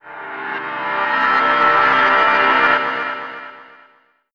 165, Keyboards; Total Drum&Bass; 90 s Sample CDs

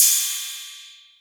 808 Cymbal; Drums

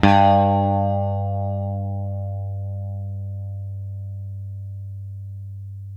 SITAR G 1, CORAL SITAR; East West; Steve Stevens Guitar Samples Collection

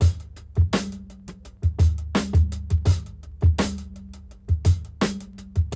break; Zero G - Man Machine

